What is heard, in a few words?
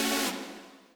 bass